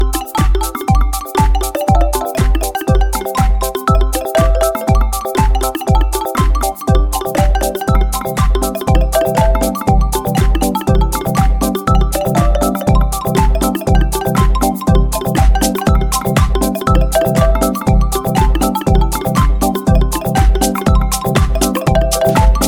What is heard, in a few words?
hip hop; samsung